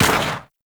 Fighter Rager Fire; Grineer; Space Fighter Rager Agent

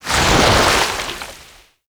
Aura Ink Explosion; Shadowgrapher

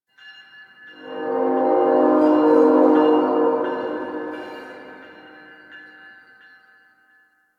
Distant Train C; Warframe; Ambience; NineteenNinetyNine

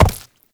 Step Flesh; Movement; Uriel; Warframe

